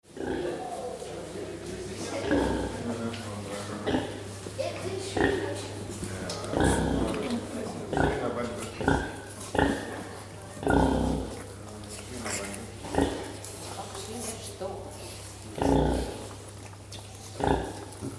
Zoo Schwein Islaendisch

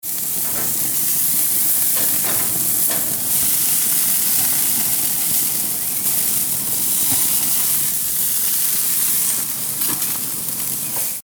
Gasgrill; Feuer & Grill; Gasgrill B

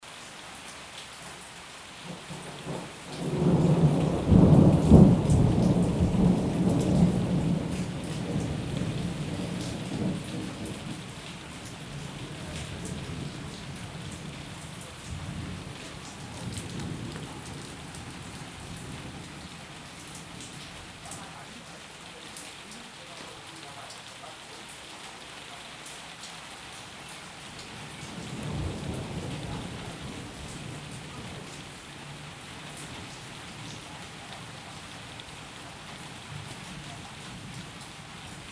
Sommerregen mit Donner

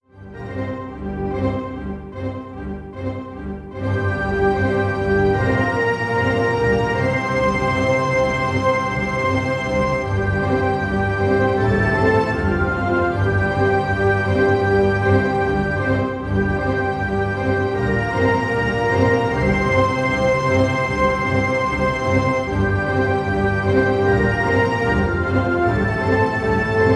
Orchester Verlaufsmusik

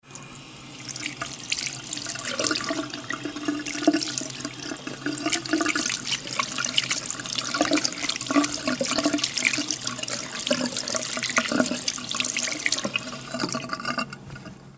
Kleines Waschbecken

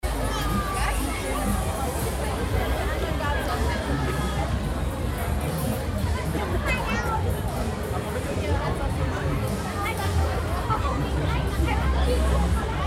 Rummel Menschen Gemurmel

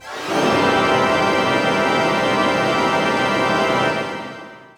Orchester Jingle Tusch A

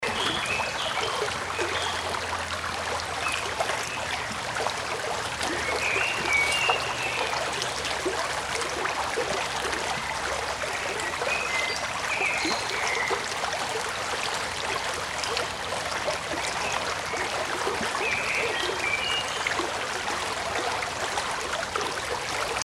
Bachplaetschern Amsel; Meerbach; Filsquelle

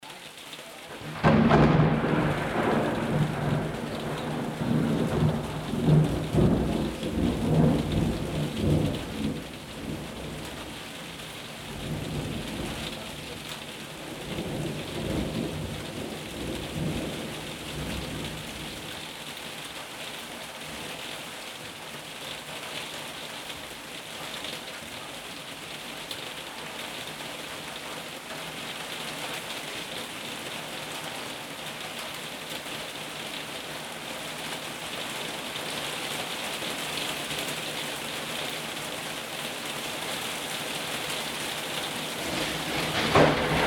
Sommerregen mit Donner

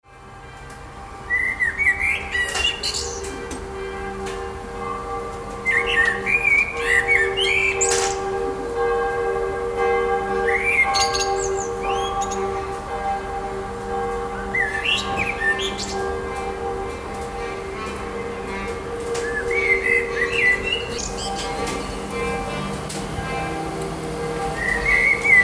Abendstimmmung Glocken Amsel Ferne Sax

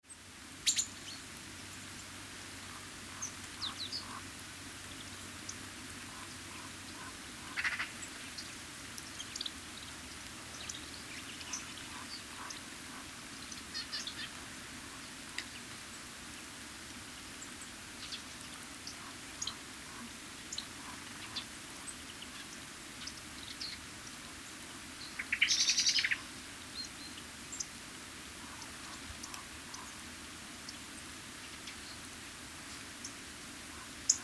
Voegel Bachrauschen Amsel

